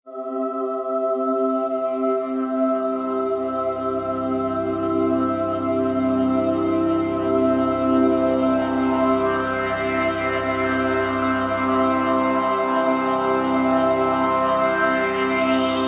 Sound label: Angelic Transition